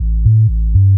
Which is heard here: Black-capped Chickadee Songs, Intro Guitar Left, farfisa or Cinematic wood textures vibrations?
farfisa